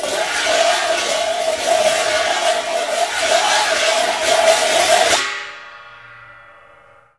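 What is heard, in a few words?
CHINA, cymbal; Spectrasonics; Heart of Asia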